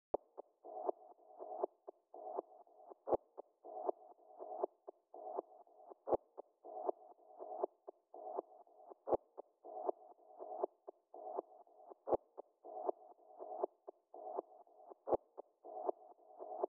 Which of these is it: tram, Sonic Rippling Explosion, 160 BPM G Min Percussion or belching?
160 BPM G Min Percussion